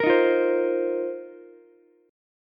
A sharp Suspended 2nd Suspended 4th, Ukulele; strummed; A#sus2sus4; JGuitar

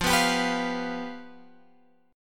F Suspended 2nd Flat 5th, down, Guitar, Open G

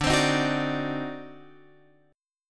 D 7th Flat 9th D down Guitar Open F; D7b9 strummed; JGuitar

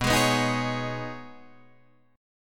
B 6th Add 9th B Guitar Open D, chord

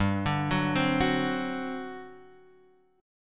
G Major 7th Suspended 4th, G, down; GM7sus4 arpeggiated

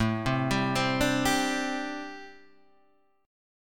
G Suspended 4th A, Gsus4/A chord; arpeggiated; JGuitar

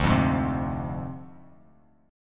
D Minor 7th Flat 5th Bass Standard; Dm7b5; strummed

